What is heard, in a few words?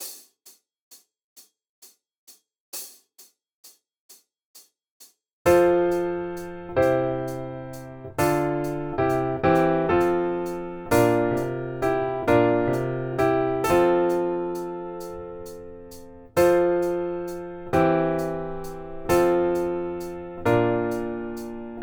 Allegretto 66 bpm